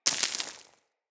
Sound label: Bullet Impact Debris Dirt; Weapons